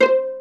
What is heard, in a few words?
PIZZ VIOLAS; E-MU Formula 4000 Series Vol. 5; Protozoa; Proteus2 Instrmt